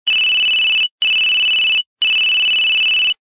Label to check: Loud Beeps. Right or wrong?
Right